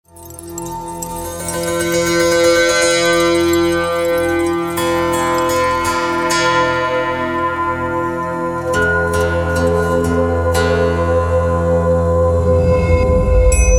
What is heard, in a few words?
monochord